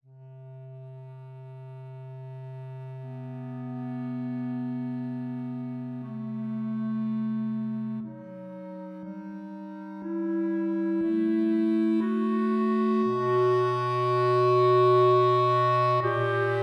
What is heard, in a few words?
Adagio Variations; II-III